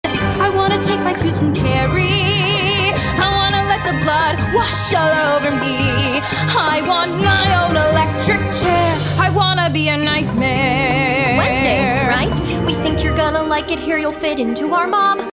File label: Sample music